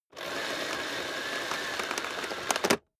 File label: vacuum cable pull in